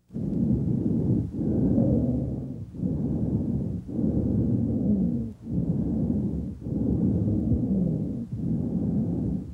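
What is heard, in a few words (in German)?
Asthma bronchiale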